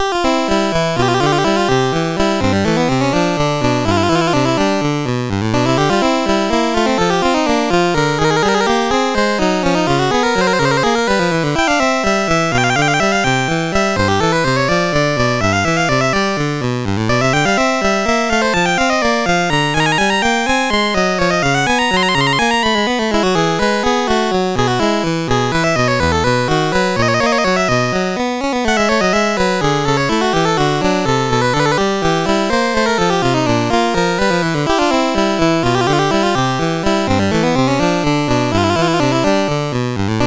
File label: Minuet, CSG8580R5